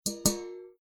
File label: pot hitting